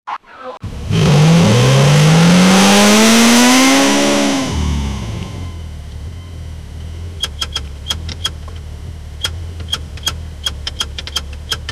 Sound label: desert heat, automobile; desert heat and hoaks